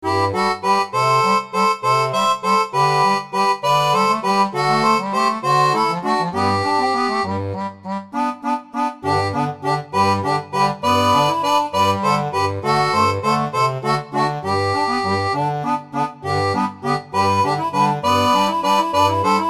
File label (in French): seraphone, orgue barbarie